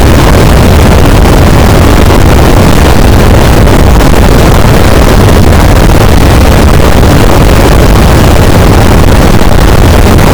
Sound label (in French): cachalot negatif, boat, Physeter Macrocephalus